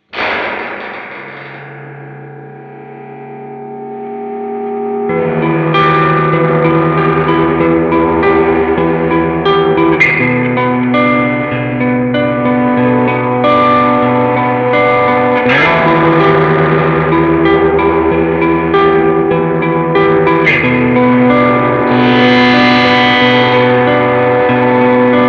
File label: light pedal feedback